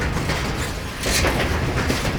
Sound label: Locker Rattle Loop; Warframe; Duviri; Glyph Puzzle